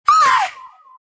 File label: scream; dota; crystalmaiden